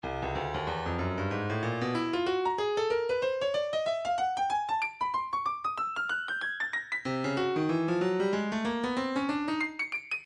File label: stylus breakbeat midi 117 bpm proghouse